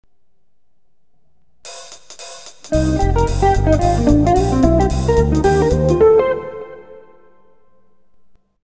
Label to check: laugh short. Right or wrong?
Wrong